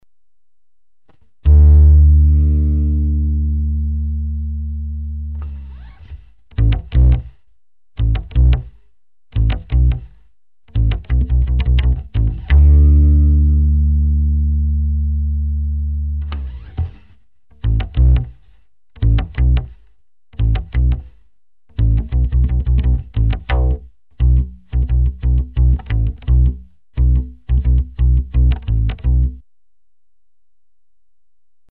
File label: BASS